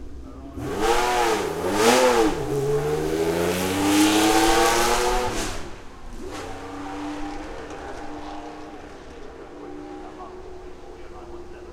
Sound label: Ferrari 512S